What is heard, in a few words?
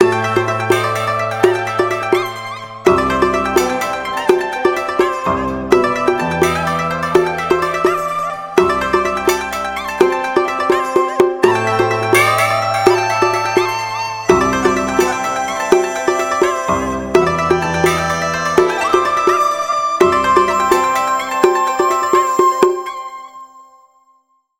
Kung Fu Master, ringtones; Honor; Magic-8-Pro